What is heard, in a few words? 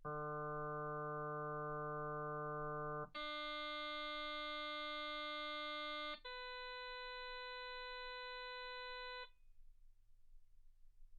harm sinus 48kHz